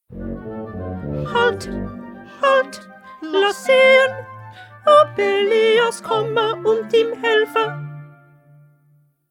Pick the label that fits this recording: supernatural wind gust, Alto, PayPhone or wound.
Alto